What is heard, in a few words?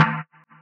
mod hit, Mod Drums; neuro; Sinistarr